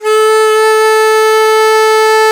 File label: DIZI, Old World Instruments, Winds; E-MU Producer Series Vol. 7 – Old World Instruments